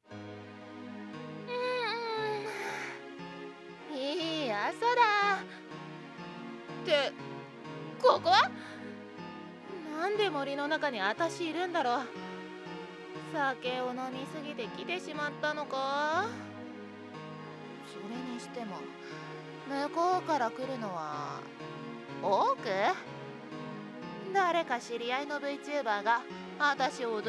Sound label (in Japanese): アエギ声ループ; ニートに優しい黒ギャル第3夜-SURVIVE